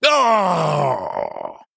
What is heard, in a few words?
Male Human Death